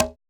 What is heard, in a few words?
LT CGA SLAP; POP-DNC 2